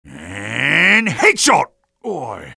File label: sniper taunt head exert